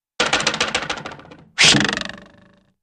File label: Diving Board Vibrates Followed By Swish & Twang; Diver Jumps Into The Air & Lands Head First On Diving Board